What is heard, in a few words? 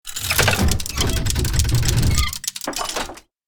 Small gears mechanism movement